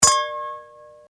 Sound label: Glass Wine Glasses In Toast; Materials